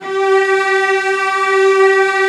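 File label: CELLOS A4, Strings; ILIO; Synclavier Strings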